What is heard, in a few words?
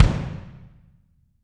BD; Bob Clearmountain Drums I